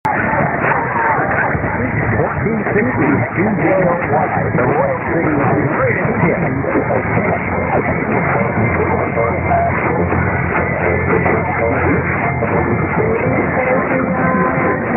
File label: 1460, mw dxing; cjoy